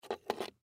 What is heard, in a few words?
Sun Glasses Up, Sunglasses Pick Up Table; Foley